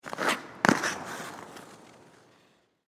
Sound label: Ice Skates Jump; Sports, Ice, Skates, Jump, Indoor, Ice, Rink; Ice Skating Sound Effects